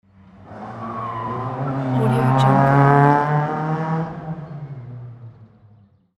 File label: car turning left with tire screeching; alexm76